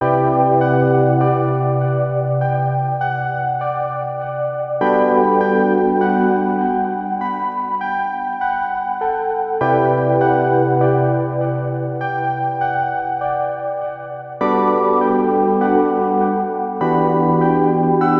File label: 100 BPM E Min Synth, Ambient; Melodies; Loop Stems; Cymatics; GHOST (Beta Pack); Above It All